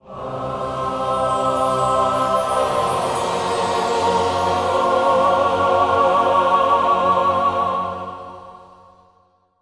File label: Bigwin; Stronghold Definitive Edition